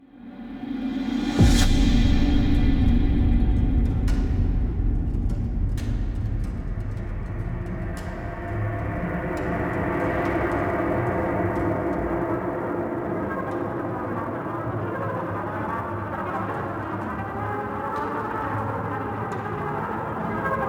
Loading Scary; dying light gamerip 2015

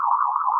Magic Shield Loop

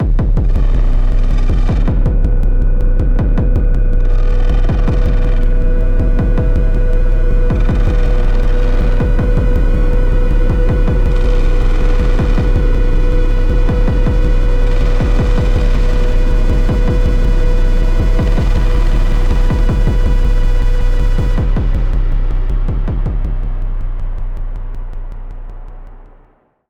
Ear Plug Amb, gamerip; dying light the following

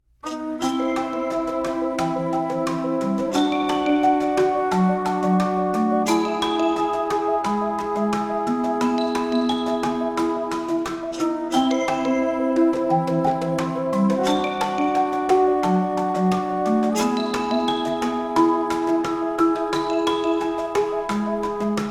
loading music; Heroes of the Storm

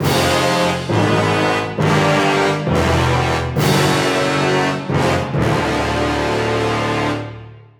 Cinematic Skulls; Ghostbusters

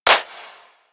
Clap Dn

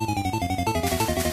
Music Character Otter Intro; save me mr. tako tasukete tako san switch windows gamerip 2018

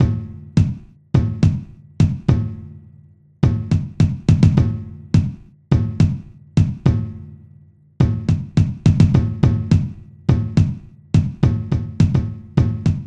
Bass Drum Loop; B I G

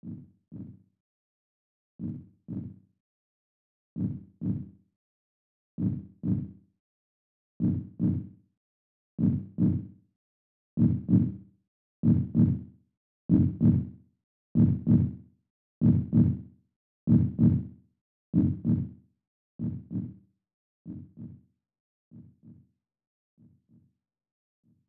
Heartbeat; Terranigma; SNES

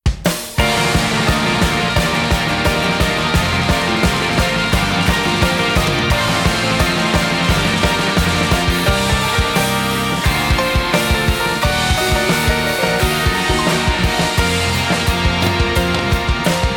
op short inst; atri my dear moments android ios mobile switch windows gamerip 2020

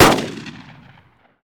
Weapon Rifle Fire; Desert Rats vs. Afrika Korps

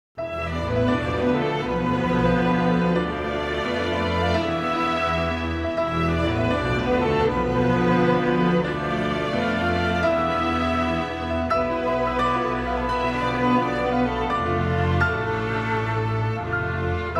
Harp and Piano Fantasy; Real Bout Garou Densetsu Best Collection

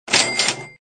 achievement unlocked

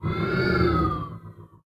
Customer Move; Kiosk

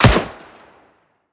Mp9; Wepons Mp9

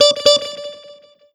Horn

